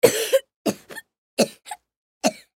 Human Cough; Cough Sick Male Seven Yrs Boy; Human Cough Sick Male Seven Year Old Boy; Blastwave

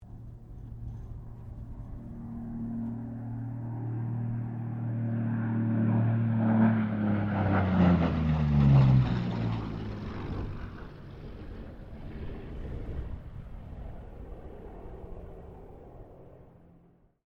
AIRCRAFT P-40 KITTYHAWK PASS STEREO

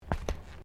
FOOTSTEPS THONGS (FLIPFLOPS) TILES STEP

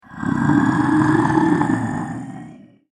Basilisk Awakens, monster sound; The Basilisk Awakens; fantasy; Andrew Fleming

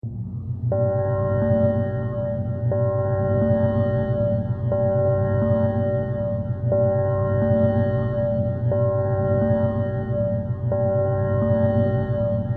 Spaceship Alarm, Spaceship cabin alarm loop; Tech

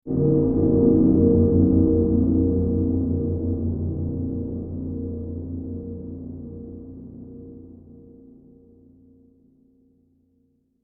Church Bell Haunted Ominous Desolate Scary; Horror; Scary Tone; Scary Tones

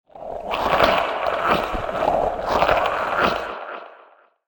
Stuck In Spooky Swamp; Scary Soundscape Sound Effects; horror sound effect

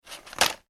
impact paper bag drop; Small Paper Bag Dropped Onto Hard Surface; Objects; McKinney Sound

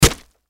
Flesh Stab Impact Hit Juicy Wet; Stab And Slice Sound Effects; Horror; Stabs and Slices; Blastwave

